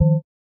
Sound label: invalid keypress; mathjax